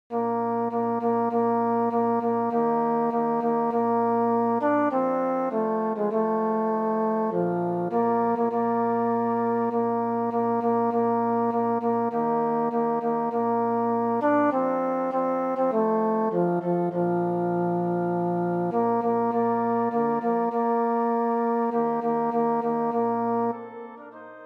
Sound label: tenor